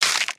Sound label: mined yumako tree, plant mining; factorio